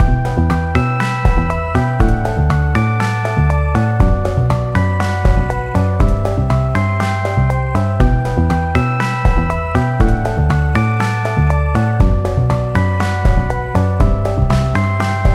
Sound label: Jingle